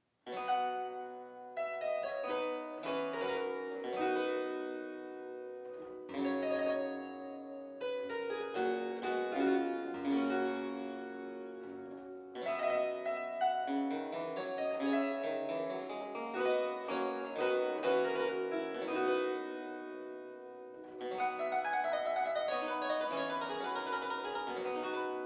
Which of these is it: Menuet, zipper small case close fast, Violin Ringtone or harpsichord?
harpsichord